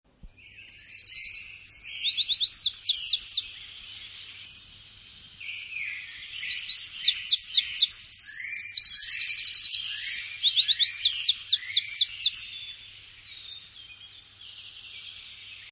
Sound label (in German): Zaunkönig